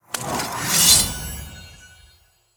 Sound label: 1H Sword Magical Underlay Play